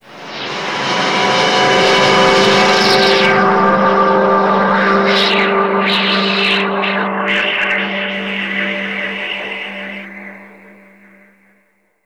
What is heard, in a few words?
Factory Floor; Total Drum&Bass; Weirdos